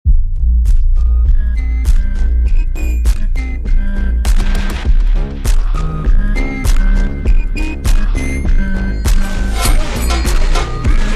Funk ultra Slowed

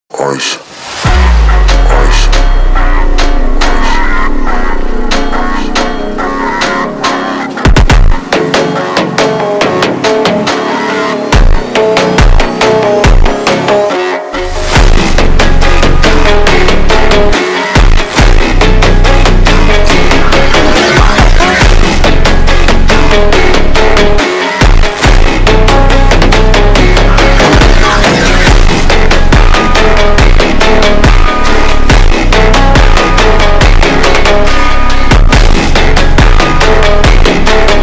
funk ultra slowed

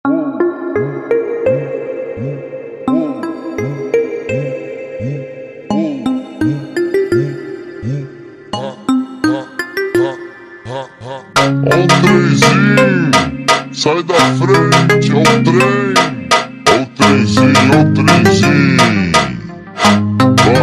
Funk ultra Slowed

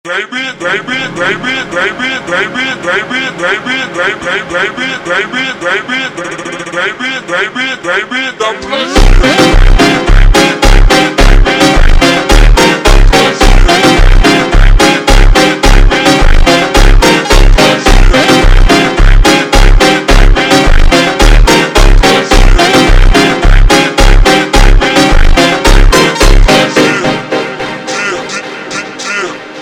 Super Slowed, phonk music

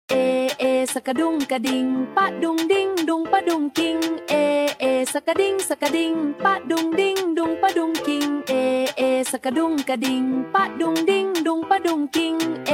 Funk extreme Slowed

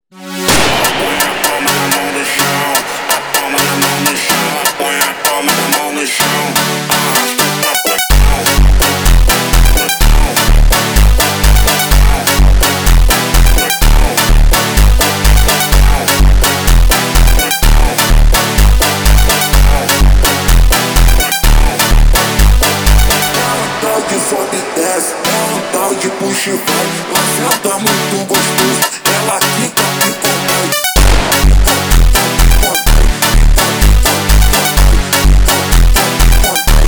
Funk, funk

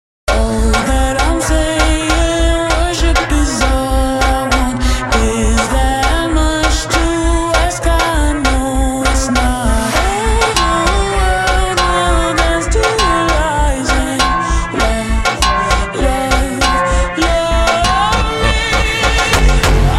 ultra Slowed; funk

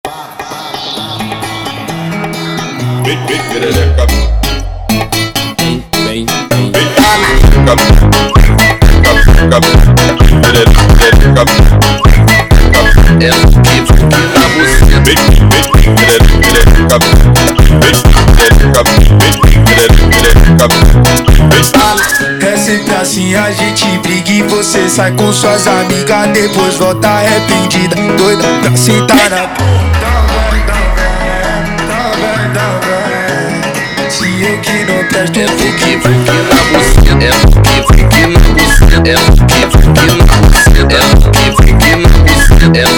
Whistle Funk

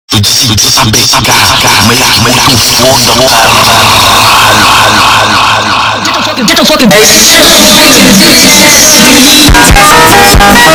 Funk

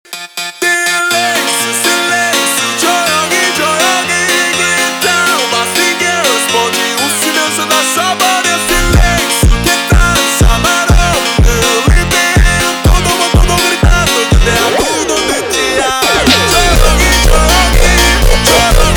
Funk Slowed, funk

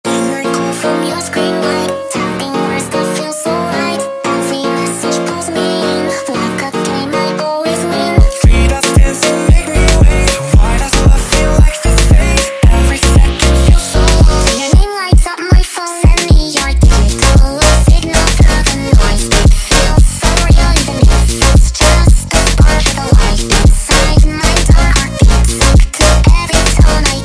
slowed, funk

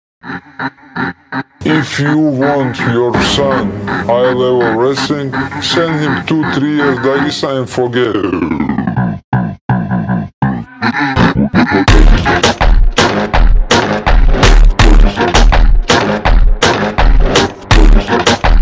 Funk super Slowed

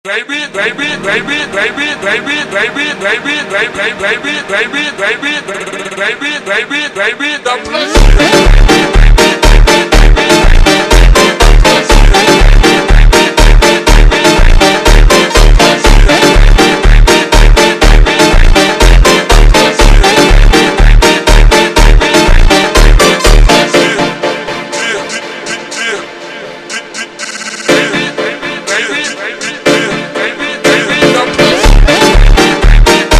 Slowed, phonk music

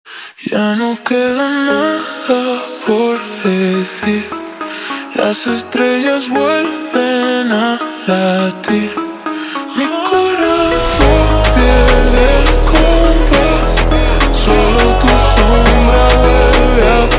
Funk super Slowed

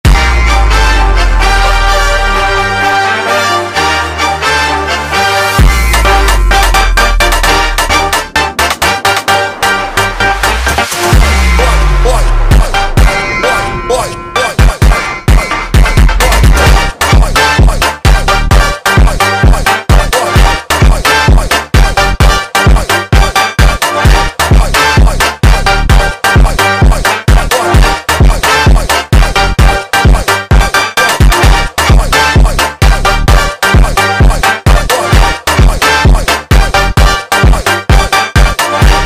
Funk

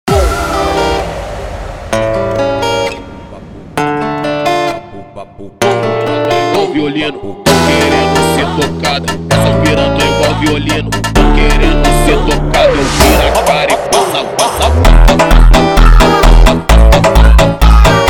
Scared Girl Funk

